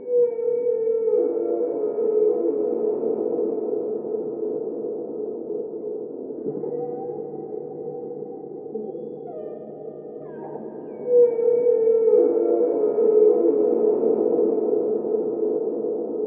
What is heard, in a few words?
whale